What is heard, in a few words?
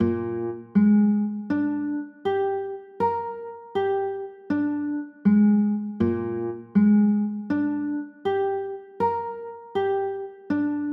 b flat 9, chords